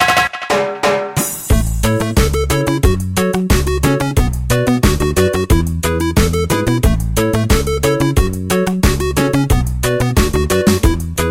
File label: Reggae Rhythm